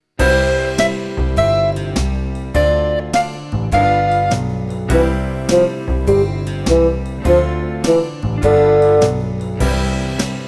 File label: Harmonica The Muha Song to play along, blues harmonica